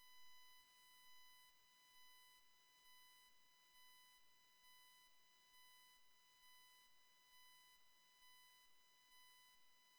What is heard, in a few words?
Radium Noise mute